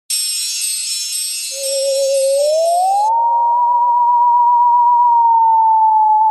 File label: spooky